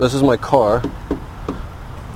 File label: Car Tap